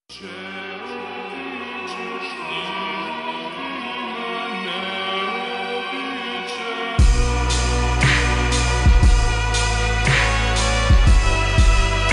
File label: slowed reverb